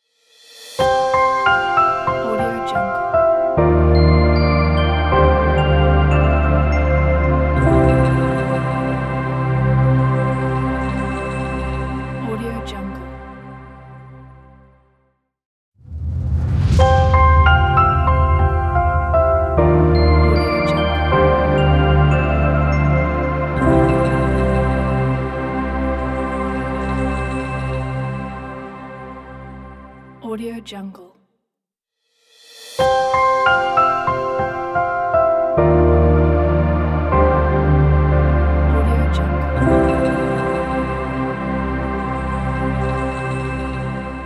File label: elegant modern piano logo ident